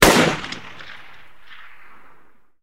rifle shot; guns; npc; War Thunder

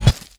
biomech impact light

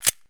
pm slider out; Escape from Tarkov

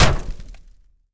hit, damage; DCS

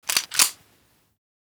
sawnoff shotgun pumpaction; Rust

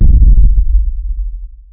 explosion rumble; War Thunder

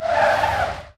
squeak

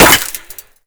impact masonry; Modern Warfare

